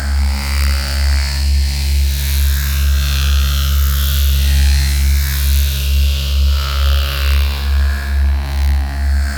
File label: Ship Shield Beam Orb Loop; Corpus Ship